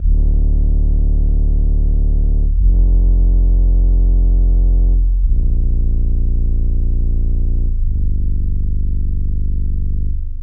92 Dm bass; Ambient Piano Loops; C and Dm Night Mood

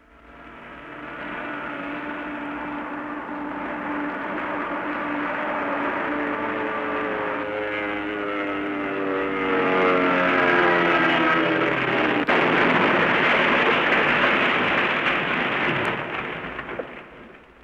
Airplane WWII and Crash; vehicles

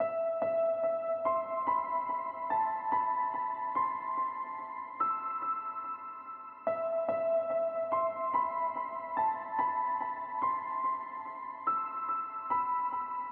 Orchestral Piano Cool E 144

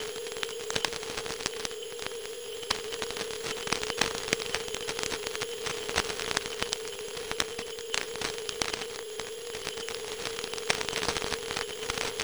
100 Noise, Ambient String Loops; Luxe; Diffraction

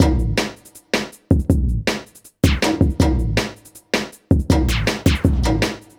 Club Dance Beat

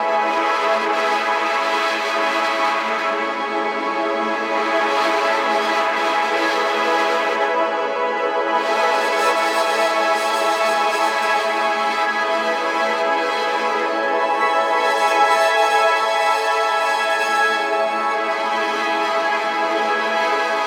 A Synth Grains, Granular Synth Loops; Hydrosphere

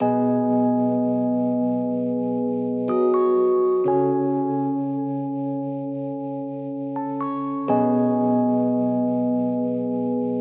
B vintage rhodes; Electric Piano; prod khufu; Electric Piano Rhoads; Various Keys

